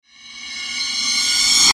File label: Ascend Swell Reverse Cymbal; Ascend Swell To Impact Reverse Cymbal; Blastwave